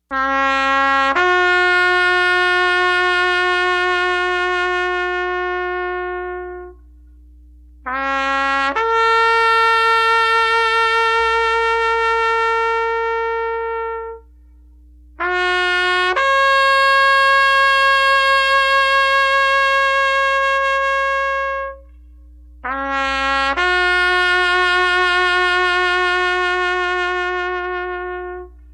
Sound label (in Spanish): minuto silencio trompeta